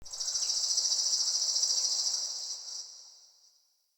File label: Bird; Ambience; Eternium Garden